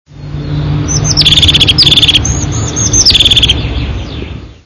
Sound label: parus cristatus